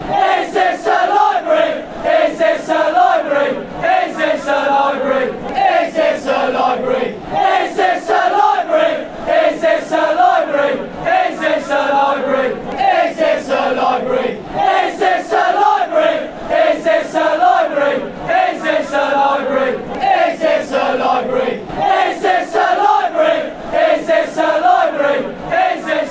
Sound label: library villa